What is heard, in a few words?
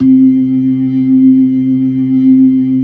LO MAN V R, ORGAN LOWER MANUAL